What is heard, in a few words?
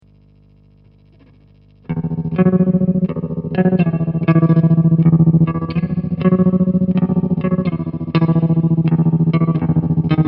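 Tremarella Elicopter, optical tremolo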